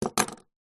household padlock metal med heavy set down on solid wood surface